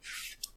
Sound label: Book Previous Page; RPG Creation Kit